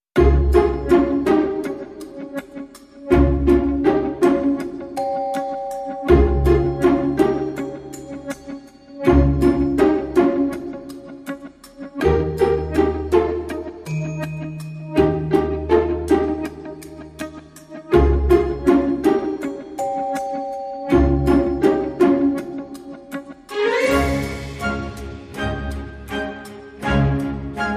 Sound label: No Clarinet and Flute Less Percussion